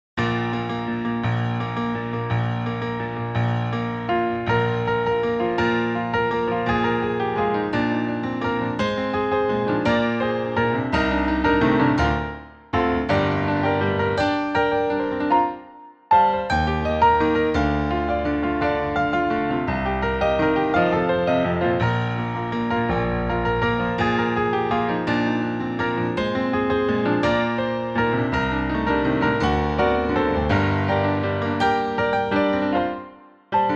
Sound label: Contemporary Tendus